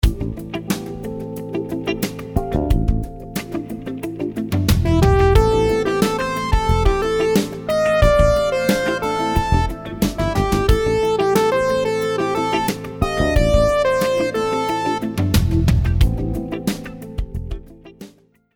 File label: rhythms 16s syncopated